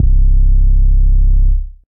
Bouncy Bass; Ableton; Factory Packs; Samplification

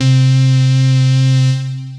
Impaled Keys; Synth Keys

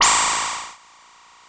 Effects; Poli

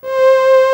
strings C5; Ableton Foundation FreePacks; Foundation Glitch Toys & LoFi

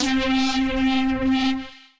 Synth Lead; Purple Tension; Ableton; Beat Tools

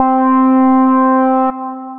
Windward P5 Lead, Synth Lead; Ableton; Factory Packs; Synth Essentials

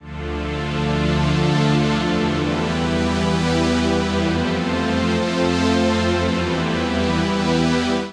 Pad (Dm) 118bpm; Music Loops; Gracious Fields; Ableton; Singularities

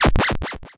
Poli Effects

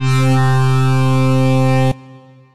Constant Pad, Synth Pad; Ableton; Chop and Swing

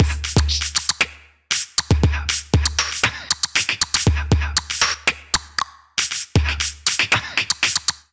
Basic Beat Box Kit 118 bpm; Percussion; Ableton; Factory Packs; Voice Box

